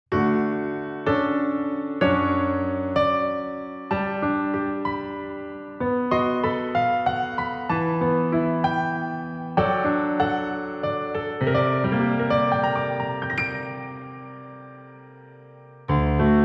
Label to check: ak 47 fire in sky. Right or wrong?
Wrong